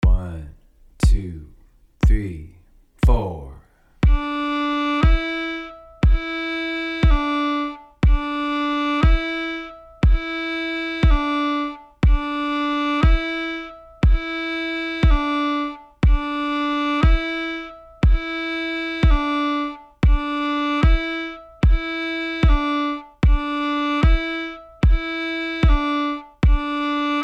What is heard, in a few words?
slur, fiddle